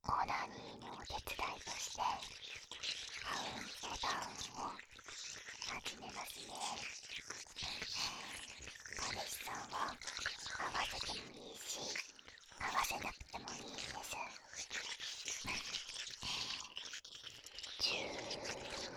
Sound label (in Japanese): 耳舐め, ASMR